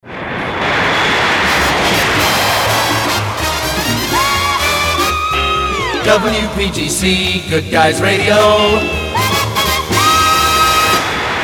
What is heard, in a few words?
jingles jet sfx; pams; series 27 jet set